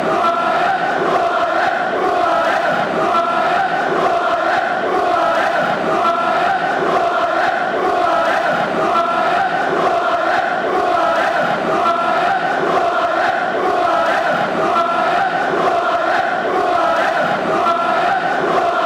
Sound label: who are ya, fan chants